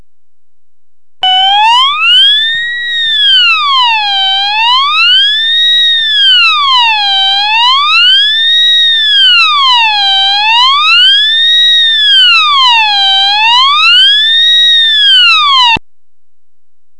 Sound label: flight crash